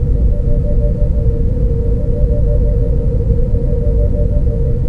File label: ent bridge rumble; trek